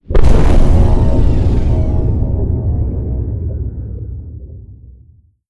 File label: extra dimensional ship death; ambient; Stellaris